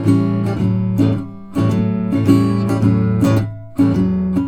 Blues Guitar, riff; ILIO; Fretworks; Blues Guitar Samples; SIMMS RIFF

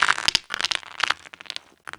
Crunchy, Beats; Sonic Foundry (Sony Creative Software)

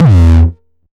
909 Dist Reso - E2, Kicks; Drums; Mbase 11 Kicks

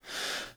BREATH; Northstar - New Gold VOL-2

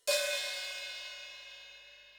hi-hat, Millenium MPS-400

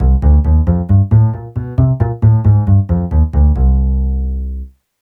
TV Suspense Bass-C, 135; Zero-G Kocktail Kollection; Construction Kitz (Lounge); TV Suspense Mixes 135

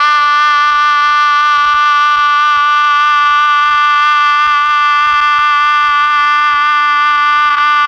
MEL OBOE

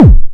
909 Kick; Cwejman S1; DevSnd Cwejman S1 - JK

